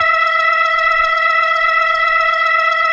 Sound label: E5F, B3